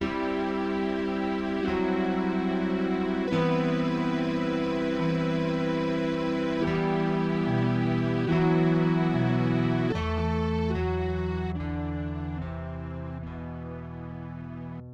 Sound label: Fanfare; starshot; nintendo 64; N64; Space Circus Fanfare